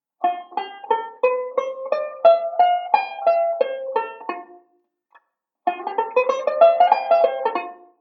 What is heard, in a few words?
Modal Sequence, banjo; Beyond Chord Melody Volume II